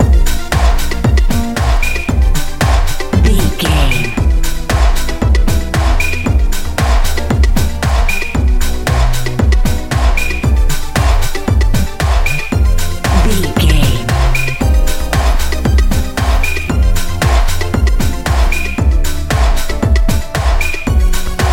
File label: nice fun techno